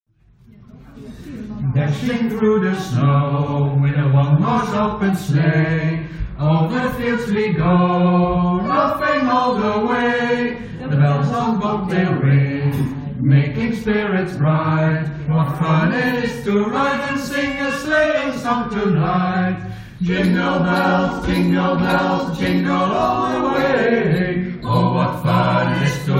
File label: Jingle Bells; Tourdion